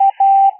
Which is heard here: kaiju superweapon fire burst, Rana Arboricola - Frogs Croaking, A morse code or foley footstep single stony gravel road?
A morse code